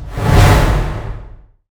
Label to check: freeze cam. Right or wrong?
Right